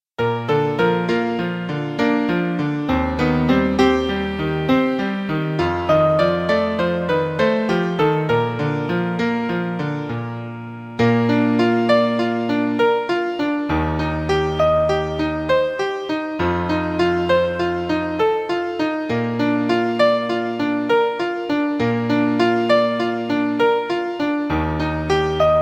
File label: bass beautiful dreamer accompaniment